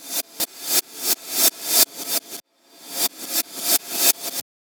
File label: hihat